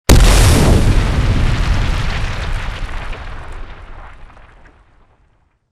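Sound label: explosion close kaboom; corporate; Titanfall 2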